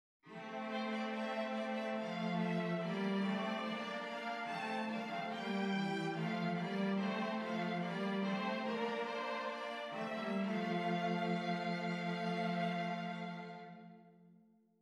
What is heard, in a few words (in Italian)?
esercizi di doppio contrappunto nella decima a Tre; Ronge pagina 183